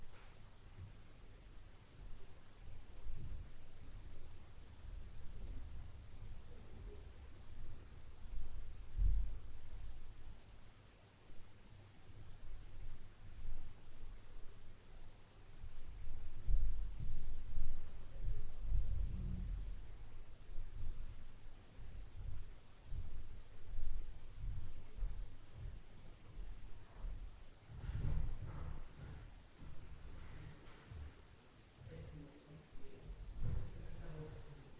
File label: Stairs Noise Speakers Smashing Door Noise; Night Noise